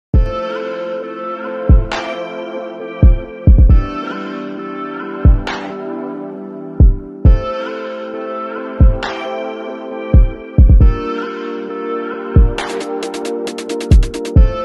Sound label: english ringtone